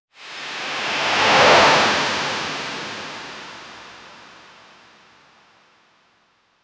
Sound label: swoosh sounds for my moti, motion graphics